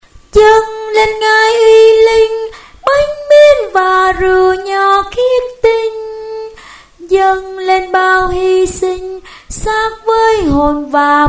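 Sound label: soprano alto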